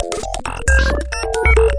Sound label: 134 bpm klong bling bloop, drum loops and breaks